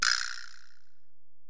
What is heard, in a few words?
Ac Perc, Drum Machines Alesis DM-PRO